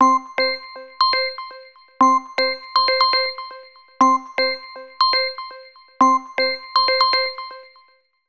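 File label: Fiction, ringtone; X1; Probe; Sony Ericsson; Venus (Xperia X1)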